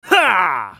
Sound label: spell cast effort; VarusAscended